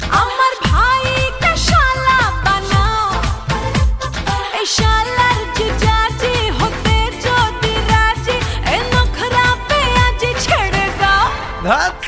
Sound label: Bengali Song